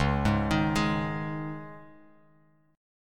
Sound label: Db Suspended 2nd Bass Standard; arpeggiated; Dbsus2; JGuitar